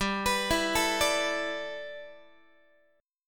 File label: G 6th Add 9th G, Guitar; G6add9 arpeggiated; x,15,14,14,13,13